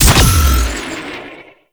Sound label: Power Slide Boost Ready Stinger, Motorcycle; Warframe; Motorcycle Ninety Nine